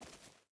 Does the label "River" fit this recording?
No